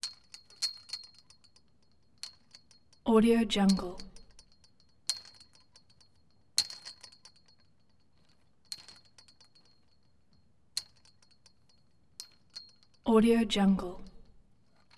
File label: ext chain link fence rattle; collanderson